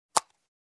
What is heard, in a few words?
Plastic Cups being piled